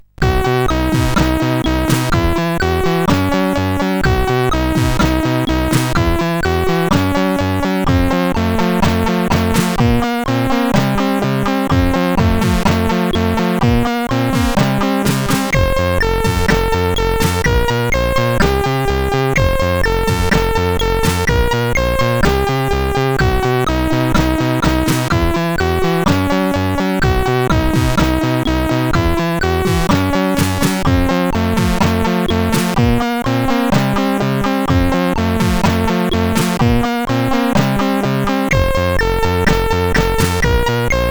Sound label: News intro, CSG8580R5